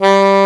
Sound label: SAX G2, SYNTHESIZER LEAD